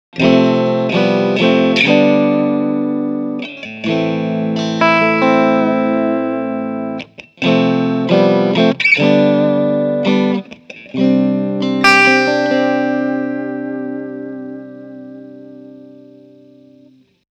strat clean mitte Steg

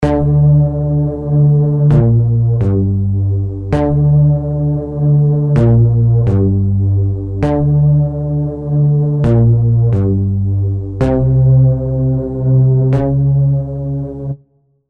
Bass Agressive unmoduliert; Heavyocity Mosaic; Operator 1979

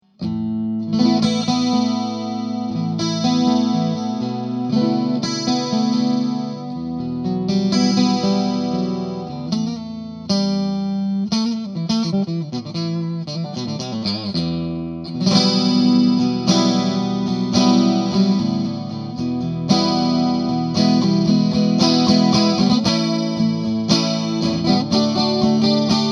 12-String + Reverb Moduliert

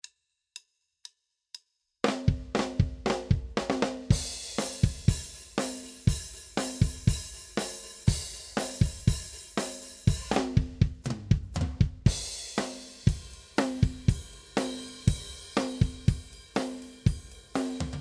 Drumbeat mit BD Mikro bearbeitet

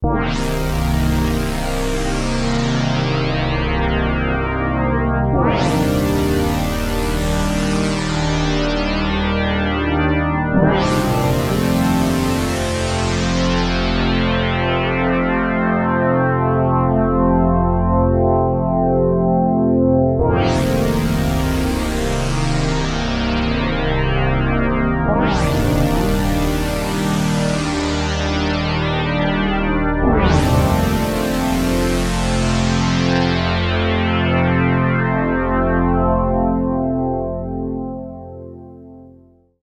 behringer ubxa volle pracht

